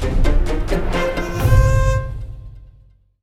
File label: Win game stinger; tricky towers